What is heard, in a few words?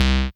Dance Mega Synth; Best Service; 90 s Sample CDs